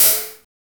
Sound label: drums